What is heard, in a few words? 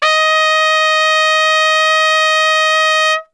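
D#3 trumpet straight, brass; Best Service; Brass Super Section; TRP STRGHT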